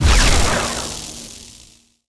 gravitons launch; Tanks

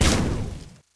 streamers shot; Tanks

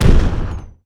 big pop; Tanks

